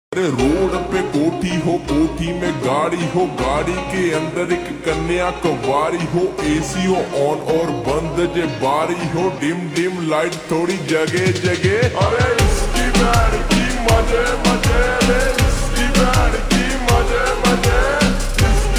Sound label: Ringtone slowed and reverb